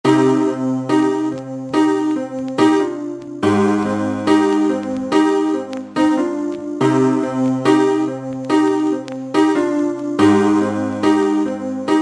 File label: chill beats 70; Retro Reverie Peaceful Patterns